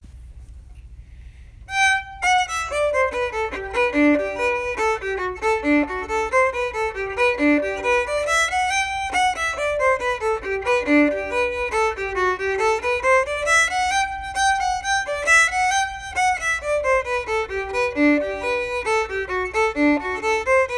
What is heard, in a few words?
reel montreal